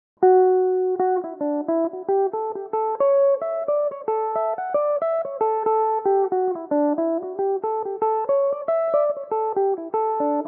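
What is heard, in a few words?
jig, D major, plain version, mandolin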